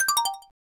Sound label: cam stop; KV510; LG